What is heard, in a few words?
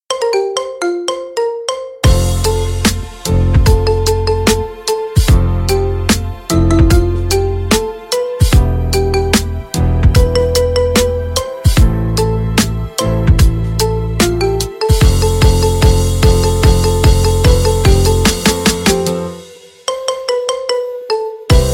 marimba remix